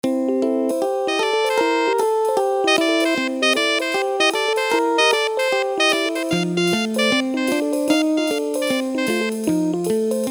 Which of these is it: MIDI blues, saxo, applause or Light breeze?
MIDI blues